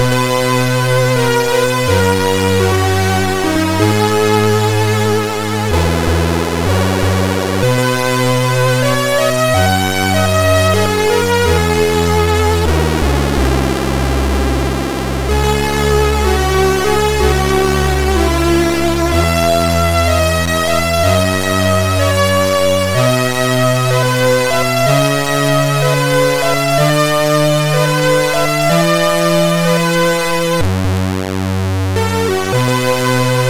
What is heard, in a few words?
sid MOS6581R4